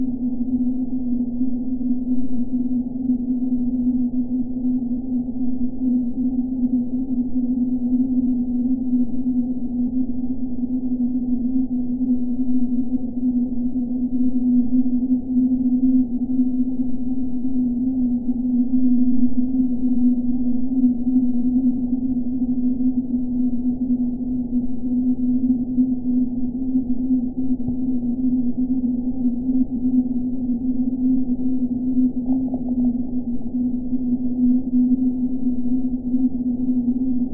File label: horror zone